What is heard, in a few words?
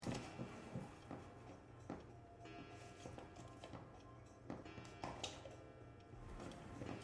footsteps